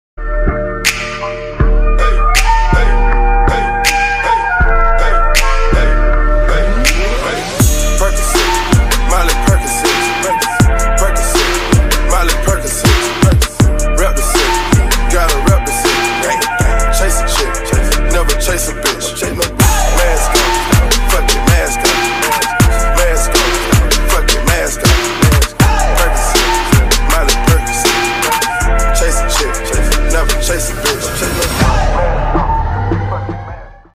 remix ringtone

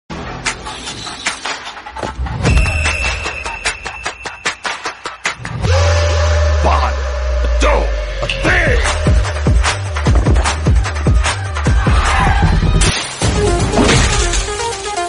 bgm ringtone background music; seetimaar; tamannha bhatiy

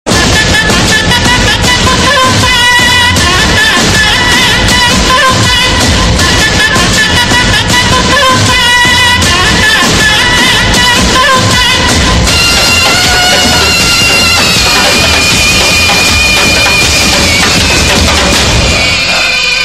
climax bgm; pudhupettai

